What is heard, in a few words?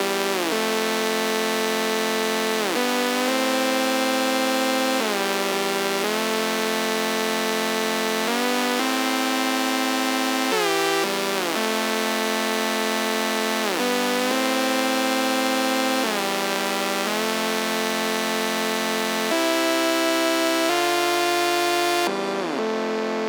174 BPM A Min Chords, DnB; Melodies; Loop Stems; Axis